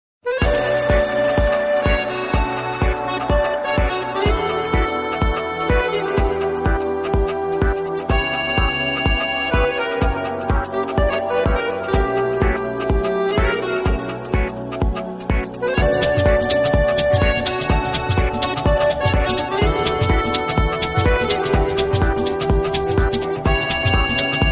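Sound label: muzika parizha, music of Paris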